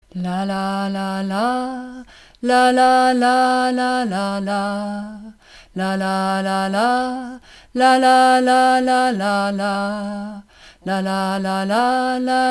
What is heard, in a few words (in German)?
Bass Strophe LaLa